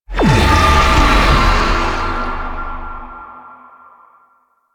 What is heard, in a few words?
Tank Infested Heal Lure Call; Enemies; Abilities; Warframe; Lasrian